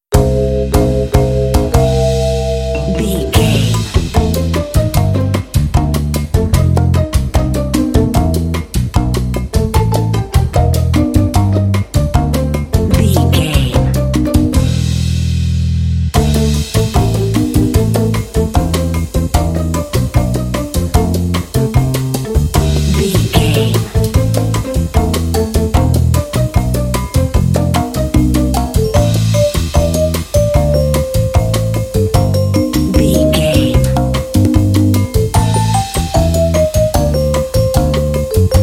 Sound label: kitchy elevator comedy lounge